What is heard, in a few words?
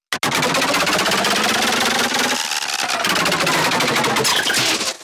time travel